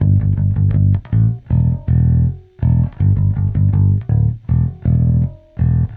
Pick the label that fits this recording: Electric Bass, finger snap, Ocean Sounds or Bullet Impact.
Electric Bass